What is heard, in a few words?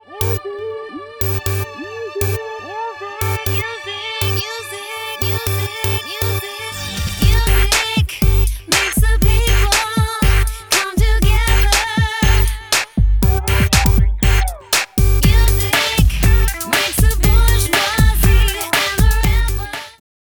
Pop, in stereo